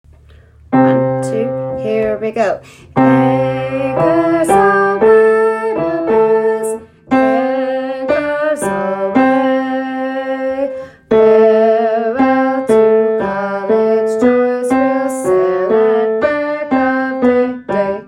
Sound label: Navy song men unison; military songs